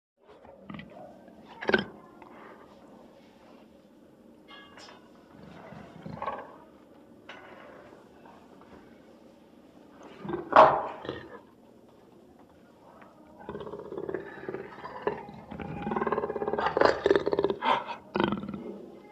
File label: jaguar sounds